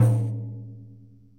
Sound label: Frame w Tamborine, World Percussion One Shots; Sony CS